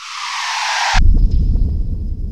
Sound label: SHUU DOON; Rubber Chicken Software Sample Bank